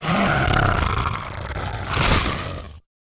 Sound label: poison plant dead, monster